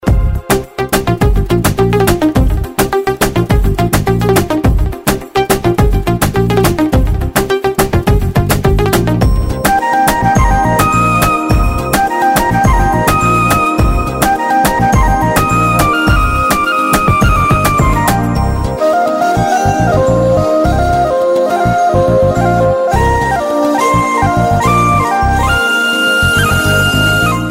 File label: bass melody